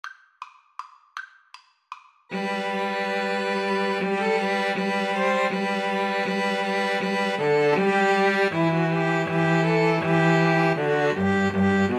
slavonic trio, 2 Violins Cello; Dvorak, Antonin

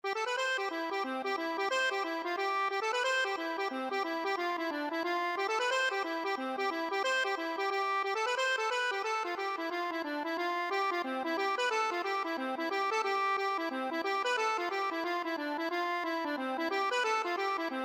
accordion

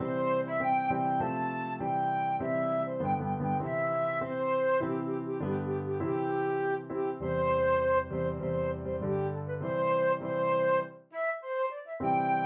chinese anthem, flute

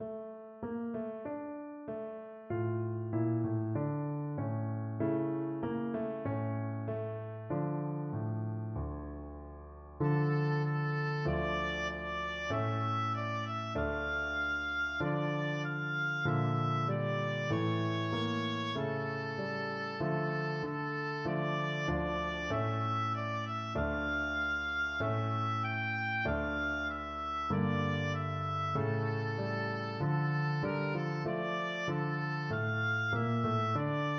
Sofou unga, oboe

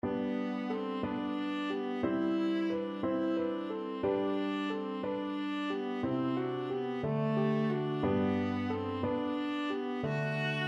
pastorale, viola

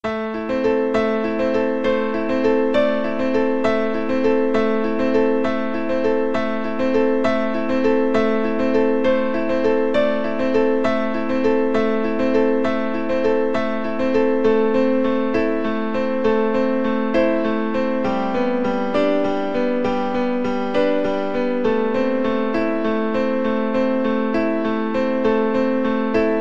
piano of desire

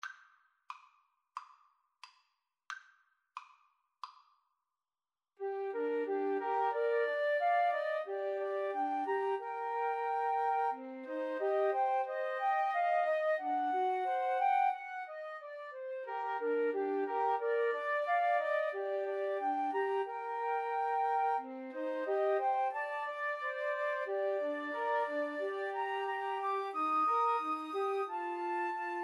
wayfaring stranger flute trio

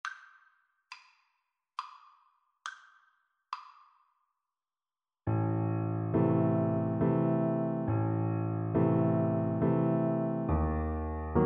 diabolus FL kar, Flute

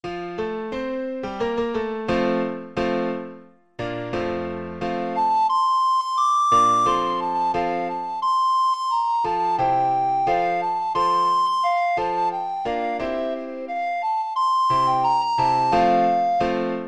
recorder

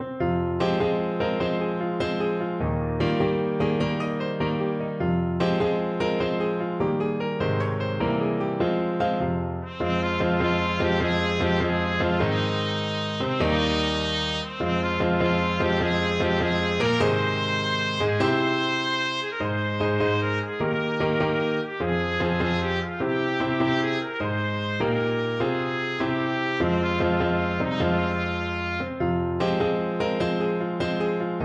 trumpet

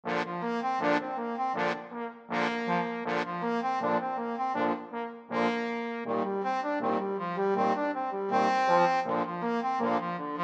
trombone quartet

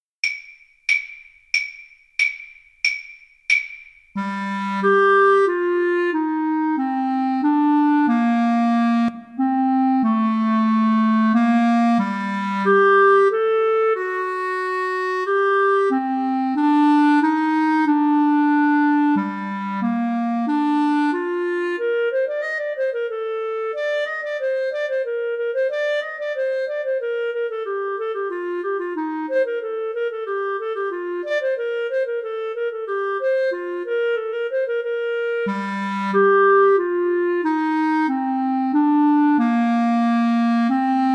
rondeau fl cl, Flute Clarinet Duet

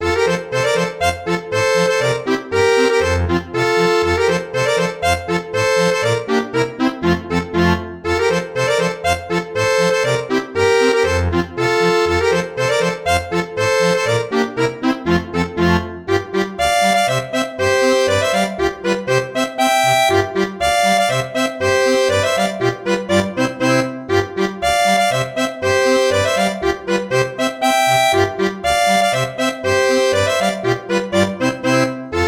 polka, accordion

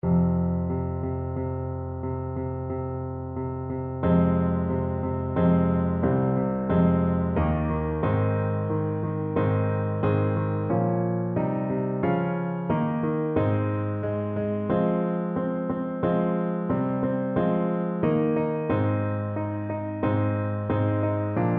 capriol pavane FL kar, Flute

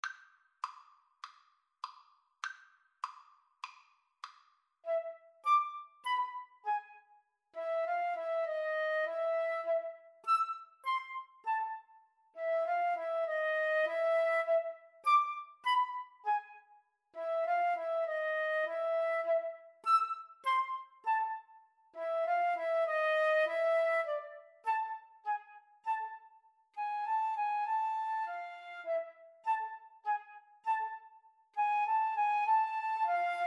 flute duet

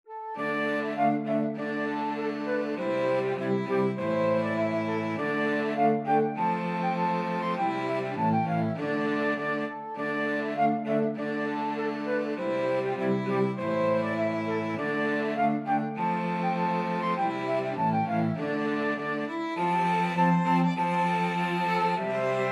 the witches hill, flute violin cello

